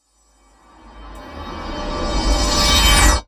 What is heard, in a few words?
cinematic reverse